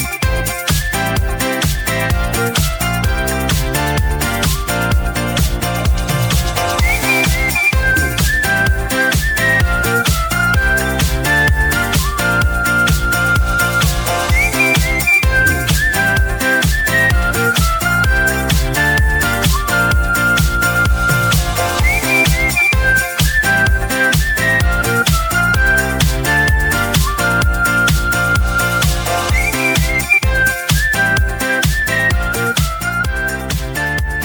Vibrant Rhythm

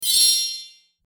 Magic Wand Sparkle

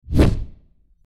Wand Swish

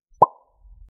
pop a bubble

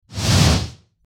swoosh transition

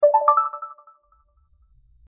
message notification

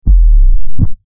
boom opening transit

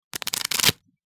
Shuffle Sound

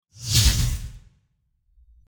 Sword Slash Dynamic

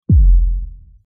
Boom Transition

